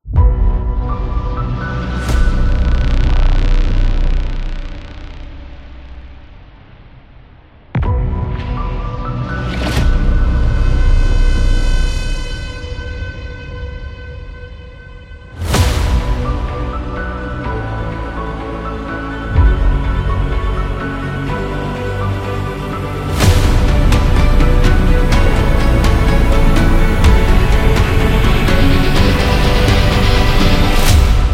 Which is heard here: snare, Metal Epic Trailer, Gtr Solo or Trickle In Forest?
Metal Epic Trailer